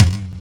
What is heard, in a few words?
tom, drums; virtual drum sounds; custom drums